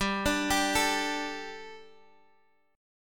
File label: A 7th Suspended 4th G